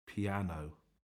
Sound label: Piano